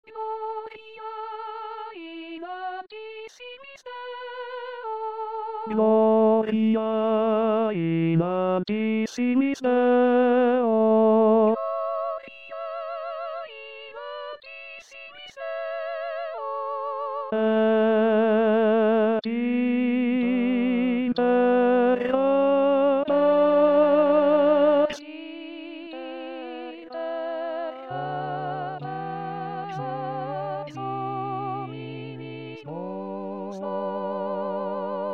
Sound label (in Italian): oratorio de noel tenore